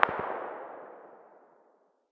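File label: light machine gun very far distance; tacz-tweak-pack; taczmechanics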